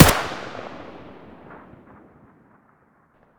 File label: ak74u muzzle stereo; STALKER; IWP